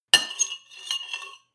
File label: cutlery on plate cut squeak; berufe; soundpool